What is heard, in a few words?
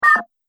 Multimedia Button